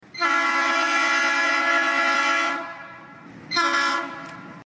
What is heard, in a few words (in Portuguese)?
Buzina; Comboios